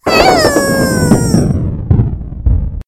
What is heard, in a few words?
fail sound; BlackCatSkin; BlackCatSiRi